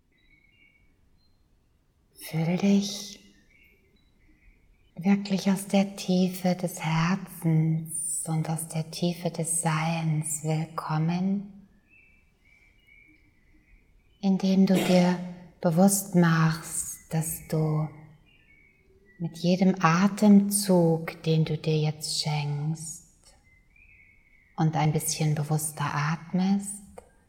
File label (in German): Kronenchakra Meditation